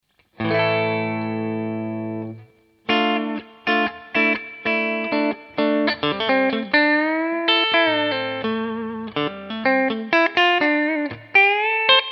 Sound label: telecaster; Mitte